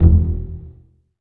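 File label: bass pizzicato E1, Virtuoso Strings; ILIO; Kirk Hunter Virtuoso Strings VOL-3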